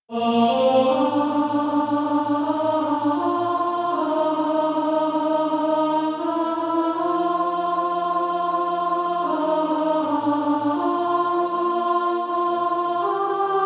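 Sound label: alto, Practise Music; Coming Home to Thee; SATB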